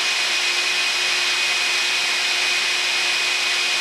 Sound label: hover engine